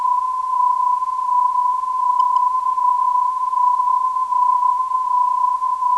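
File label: non-directional beacon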